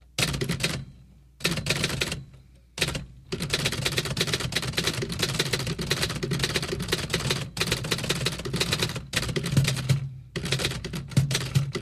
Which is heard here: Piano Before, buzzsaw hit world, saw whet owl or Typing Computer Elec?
Typing Computer Elec